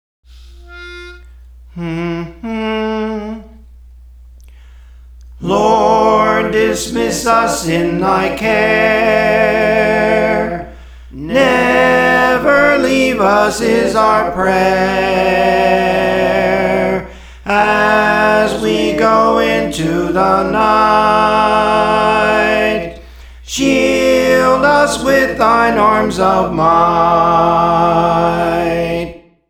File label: Soprano; Praise For The Lord Training; Lord Dismiss Us in Thy Care